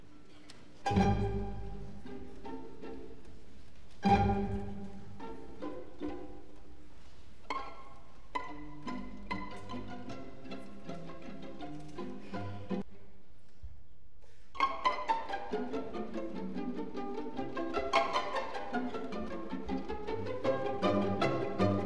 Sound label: Pizzicato-Polka; Willi Boskovsky; New Year's Day Concert In Vienna 1979; Josef Strauss